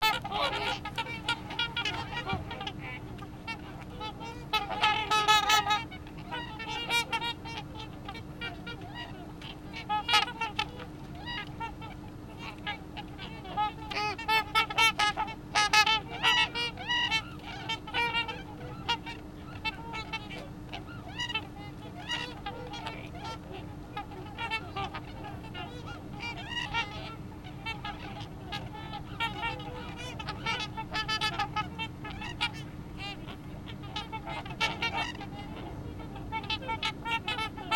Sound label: swans